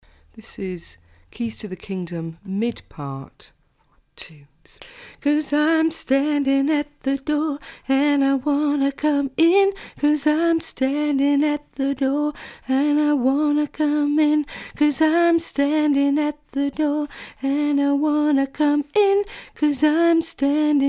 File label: keys mid, gospel